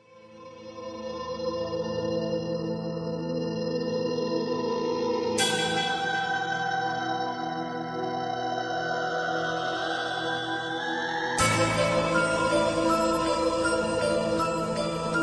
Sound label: dark rap instrumental